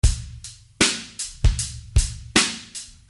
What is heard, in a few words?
beat; Slow live drum beat